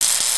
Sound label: welder WELDING quick; foley